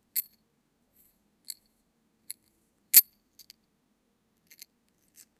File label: sound of an arrow